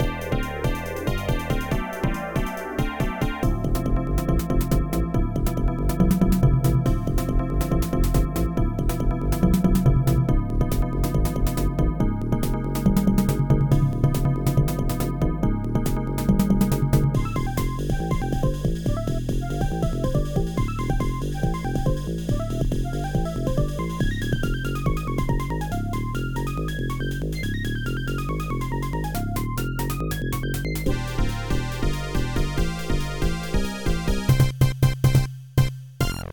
FUNKY

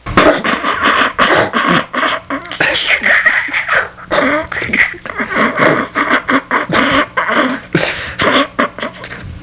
Snickering and Laughing

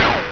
ELEC SHO, STRINGS

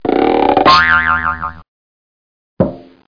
ALIEN

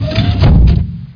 walk

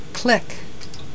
CLICK WAV

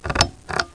Light Bulb; Smart Stacks Demo

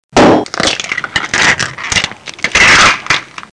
EGG BREA, HOUSE

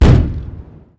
Damage Hit; Defiance

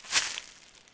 grass; ambient; Shadowman

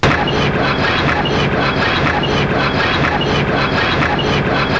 gear; Secrets DEMO; WATER

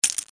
TOSS CHIP, CASINO

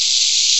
parasite pain; Wanted

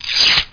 Close; MacAddict; Stay Tooned Demo

